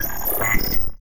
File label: alien game ui glitchy sci fi bleep digital short laser